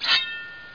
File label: key